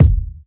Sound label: bass drum single hit d key; Duration 0:01; Channels Mono